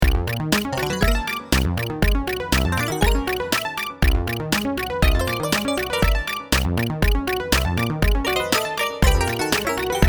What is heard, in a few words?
experimental type beat